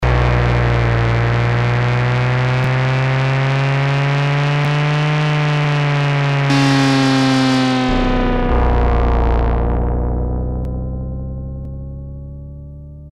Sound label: Racing Car, Yamaha TX81Z